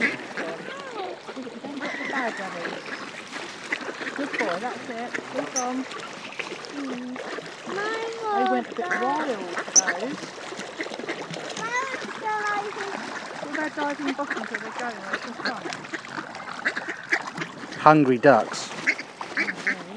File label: hungry ducks